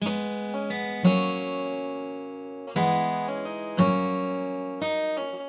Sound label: B 175bpm pluck